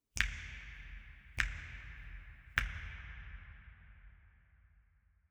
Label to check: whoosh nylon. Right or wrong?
Wrong